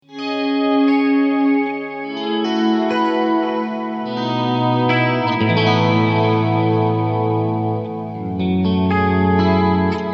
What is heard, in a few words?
swell